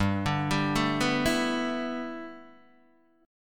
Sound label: G 6th Add 9th G Guitar DADGAD, chord